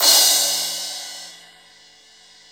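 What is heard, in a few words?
cymbal crash; Best Service Gigapack 2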